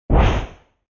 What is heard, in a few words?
Fast whoosh, called a wipe, for video editing, movements, logos, flash, or animation.; Wipes; Sound Design